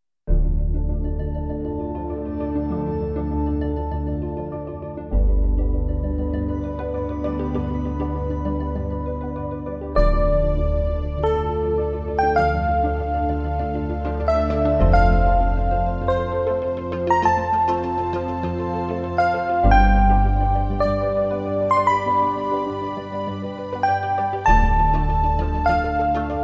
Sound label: Dark Elec